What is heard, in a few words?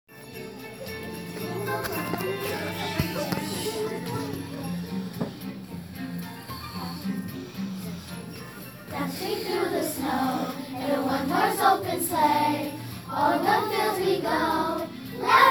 jingle bells; vandenberg world cultures academy